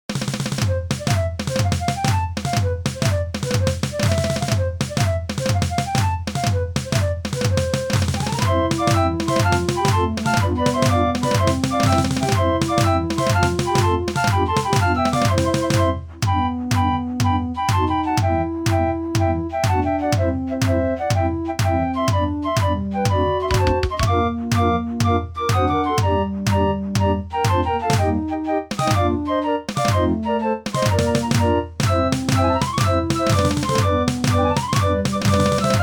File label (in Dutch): draaiorgels